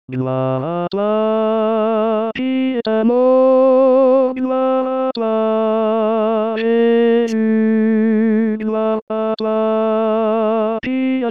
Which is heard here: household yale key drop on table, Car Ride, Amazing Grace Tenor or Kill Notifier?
Amazing Grace Tenor